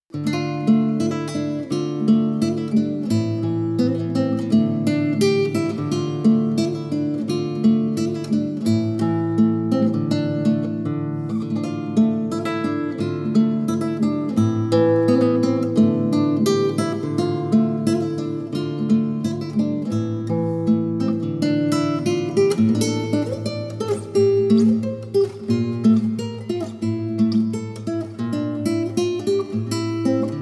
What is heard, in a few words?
Music Vehicle Radio Events